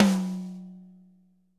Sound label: tom, drum